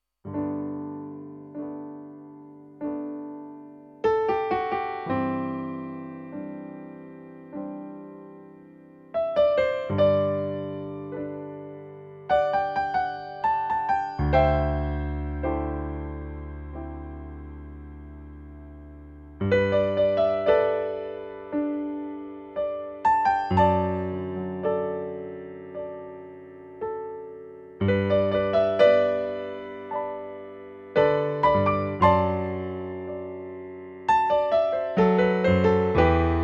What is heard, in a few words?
Piano